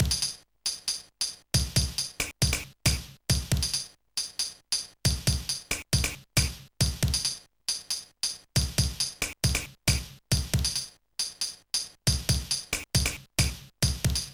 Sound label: Dynamic Drums Pattern